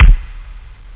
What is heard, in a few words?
kick, perc; OctaMED SoundStudio V1; jazzies samples